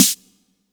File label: Snare; VEC1; Club